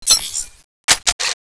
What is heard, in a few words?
clip in; weapons; tornado